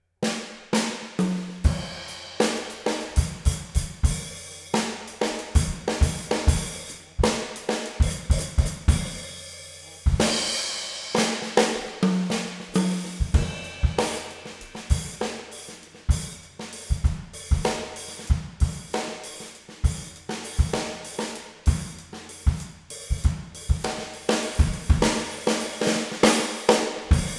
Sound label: funk